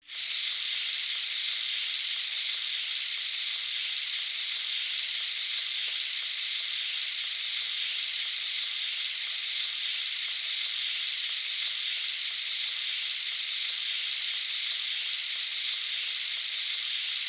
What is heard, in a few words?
Ambience Exotic, drones & tones